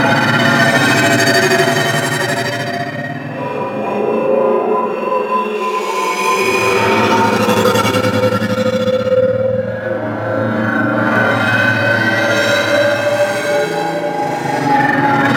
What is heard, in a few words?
Moon Artifact Medium Loop; Warframe; Ambience; Orokin Moon